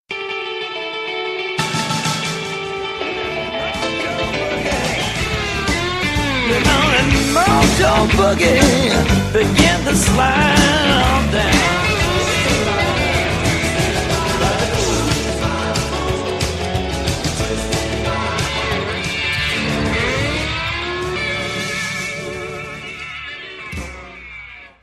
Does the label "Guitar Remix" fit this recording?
Yes